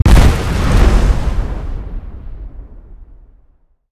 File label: explode; Minecraft; Votive World